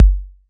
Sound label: BD 808